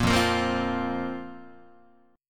F Suspended 4th Guitar, chord; Fsus4 strummed; 1,1,1,3,4,4; JGuitar

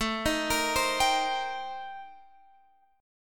C Minor 7th A; Cm7/A; arpeggiated

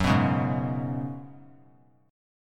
Gb Suspended 2nd Suspended 4th, Chord; Gbsus2sus4 strummed; 18,18,16,18

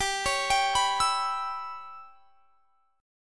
G 6th Flat 5th; G6b5 arpeggiated

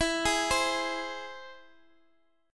E Augmented, Guitar, Half Step Down

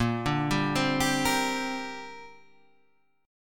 C 7th Suspended 2nd Bb

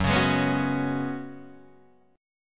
Gb Suspended 2nd Suspended 4th, Guitar DADGAD

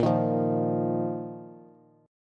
Bb Suspended 4th Bb down Bass; Bbsus4 strummed

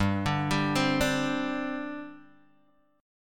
G Suspended 4th, Guitar, Open G

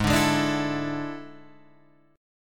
A Major 7th Suspended 4th Ab; strummed

